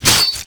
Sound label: Knife Scale, Damage